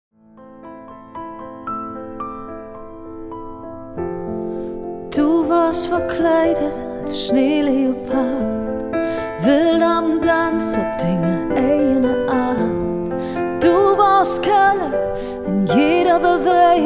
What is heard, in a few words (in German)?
Pianoversion